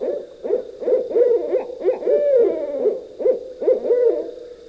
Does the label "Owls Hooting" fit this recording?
Yes